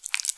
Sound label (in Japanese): くちゅ音単発